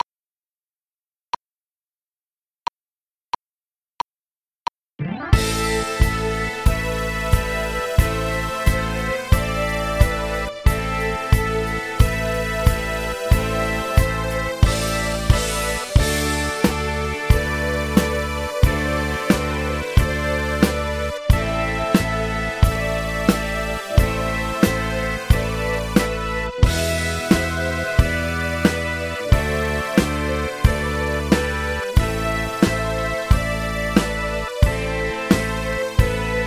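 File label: Rock Keyboard Drums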